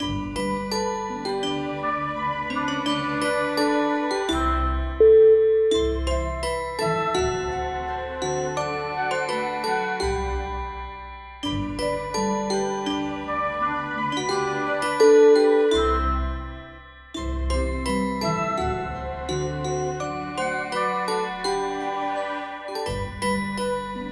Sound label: jingle bells sweetness